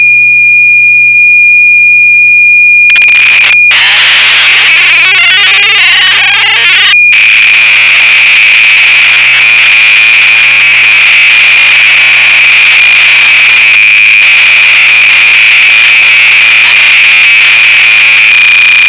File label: DMX; oberheim; factory